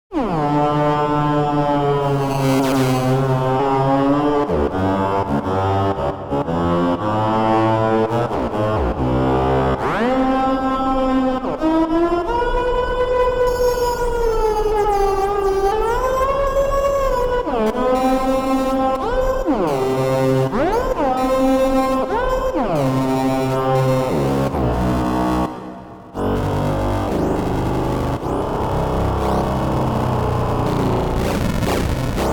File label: MASSIVE